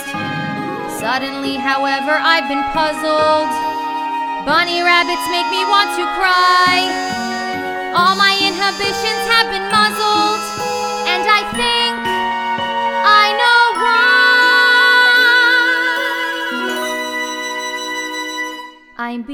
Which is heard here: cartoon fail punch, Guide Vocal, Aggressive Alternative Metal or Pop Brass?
Guide Vocal